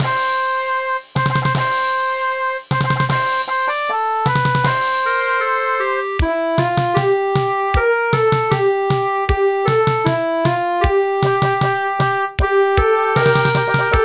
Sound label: ANTHEM Turkmenistan